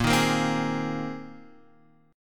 Bb 7th Suspended 2nd Suspended 4th Bb down Guitar Drop D